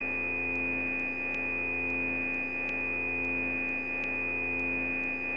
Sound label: Puck Bashed Hum; Skype